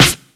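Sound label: clap f key, Royality free hand clap tuned to the F note; percussion; single hits one shots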